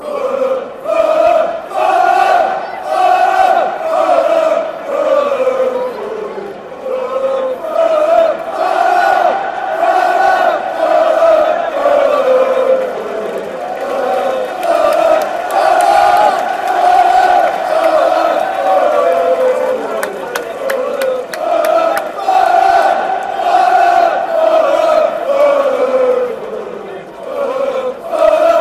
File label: fulham fulham fulham, fanchants